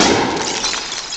LITE BULB; CMI Sound Library Rev 1.3; MACHINES

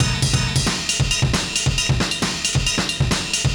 Beat, 135bpm; Zero-G Sound Sense Grime Scene; GS Construction Kits; The Hoodrat

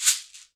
SHEKERE NET, Latin Hand Perc; E-MU Producer Series Vol. 2; More Studio Essentials; Composer

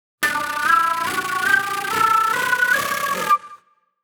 Agro Fusion Flute

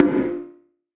Perc, Gated Reverb; creative gate; musicradar

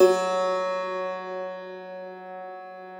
Thumb Tack Piano ff +ped, F1; Best Service ProSamples vol.53 - Classical Prepared Pianos; Total Piano

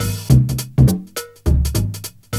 Loop, Roland; Sound & Vision - Gigapack I

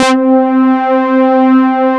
SYN, synthesizer bass; VFX-SD

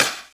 Snare snappy, drum hits; Zero-G; Soundclash

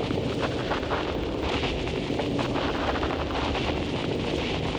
Cracklature, sparse soundscape

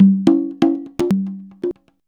CONGA 119

